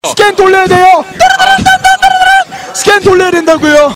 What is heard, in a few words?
scan, bot; pvplite